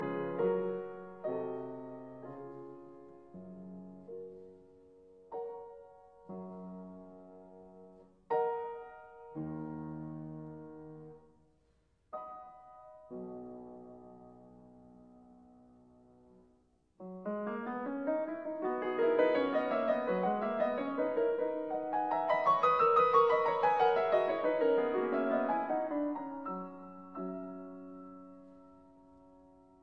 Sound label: Piano Sonata in B flat major Largo Allegro; No 29; Op 106; Hammerklavier